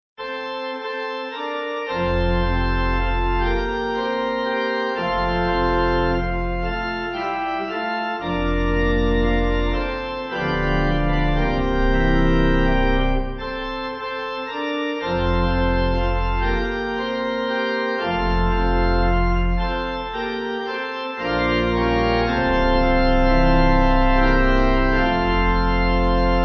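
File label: Pipe Organ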